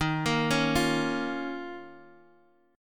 D sharp 7th Suspended 2nd, Guitar, Half Step Down

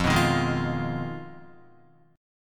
F Minor 7th Flat 5th F down Guitar; Fm7b5 strummed; JGuitar

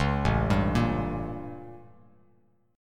A 7th Flat 9th A, Bass Standard; A7b9 arpeggiated; JGuitar

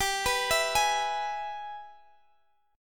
Ab Minor Major 7th Sharp 5th chord

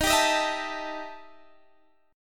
E Minor Major 7th Double Flat 5th, Eb

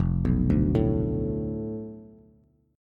F 6th Flat 5th F down Bass, chord; F6b5 arpeggiated

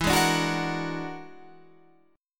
E 9th Flat 5th E down; E9b5 strummed; JGuitar

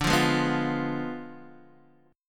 D Diminished 7th D, Guitar, Open D

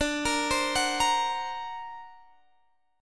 D Augmented 7th D down Guitar Standard; D+7 arpeggiated; JGuitar

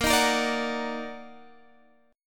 Eb Minor Major 7th Bb, chord

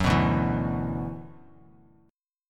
D 6th Add 9th D, Bass Standard; D6add9 strummed; 14,14,0,9; JGuitar

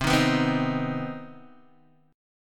C Minor Major 7th C Guitar DADGAD

